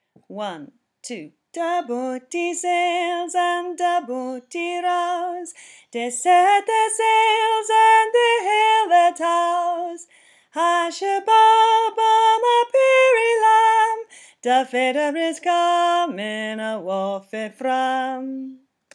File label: high part last time, Folk Choir music; Da boatie